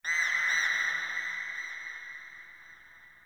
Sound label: Interior Texture High Pitch Screech, Infested, Ambience; Misc Indoor